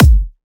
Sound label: Dubstep Kick; Drums & Percs; Ghosthack